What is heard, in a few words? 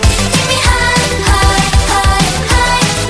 Hi High Low